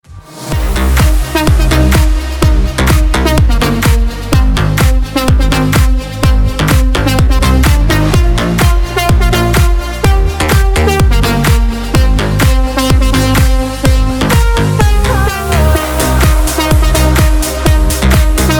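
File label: club ringing ringtone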